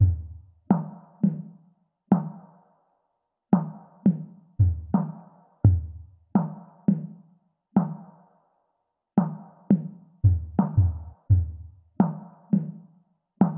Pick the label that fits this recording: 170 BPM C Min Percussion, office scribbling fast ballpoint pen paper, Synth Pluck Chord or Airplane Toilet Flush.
170 BPM C Min Percussion